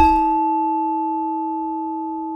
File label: Saron D, Gamelan